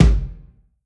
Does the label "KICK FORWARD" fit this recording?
Yes